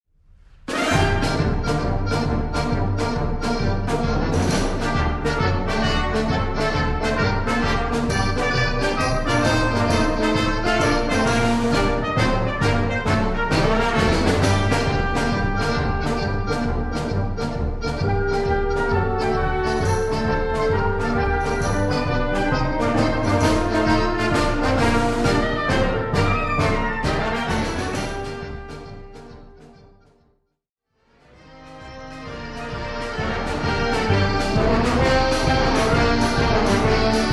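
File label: Tarantella, Band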